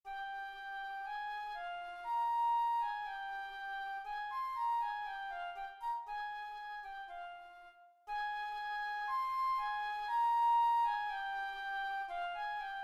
flute etude

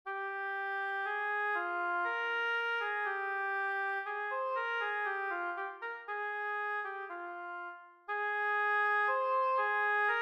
oboe etude

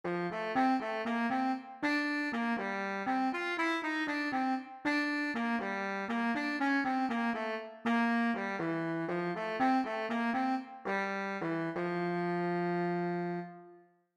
bari sax

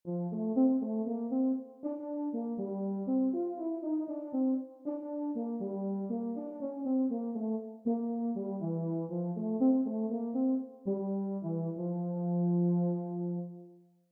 baritone

